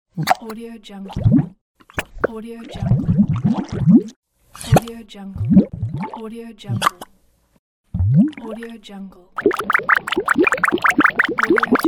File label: cartoon gurgling; volkovsound